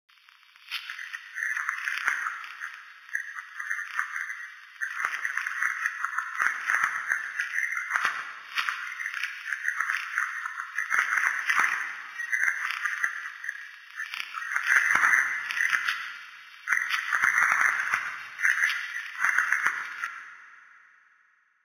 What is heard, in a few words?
Signal; Asteroid XXL